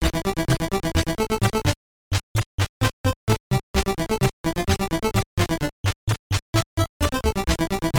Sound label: level theme; lemmings